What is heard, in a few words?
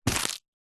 plastic packet cellophane containing small object grab pick up